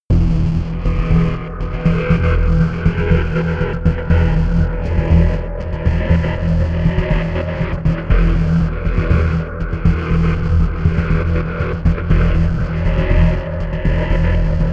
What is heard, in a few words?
sequence unsettled bass